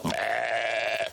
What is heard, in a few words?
beeee